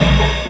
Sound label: Loop, Chamberlin Rhythmate